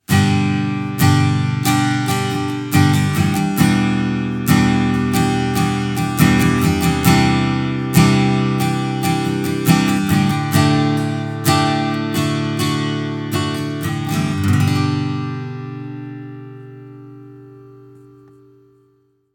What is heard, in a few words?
STRUM